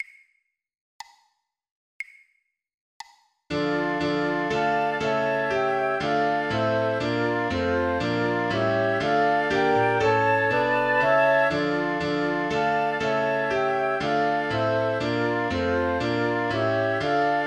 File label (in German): Flöte Klavier; God Rest Ye Merry, Gentlemen; 120 bpm; weihnachten